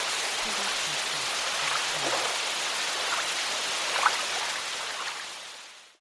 waterfall; soundscrate

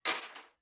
Metal Footfall; soundscrate

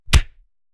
Fight Impact; SoundsCrate

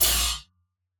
lightsword hits; soundscrate

